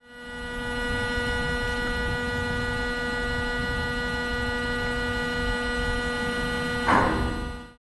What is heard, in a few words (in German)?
schleuse